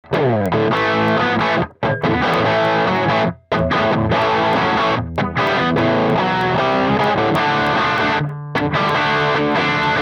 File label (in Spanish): guitarra